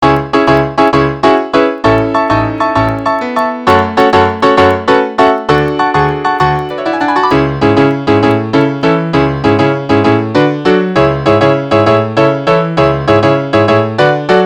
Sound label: battle piano